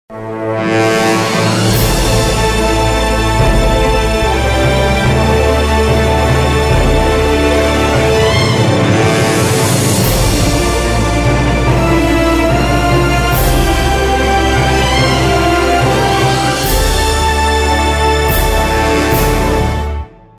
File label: TV ad music; Zodiacs Linen Shirt Positano Collection